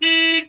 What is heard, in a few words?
Mazda MX3 Horn, Automobiles